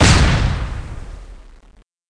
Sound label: Explode; Learn to Fly 3